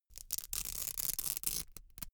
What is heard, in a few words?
velcro open cap slow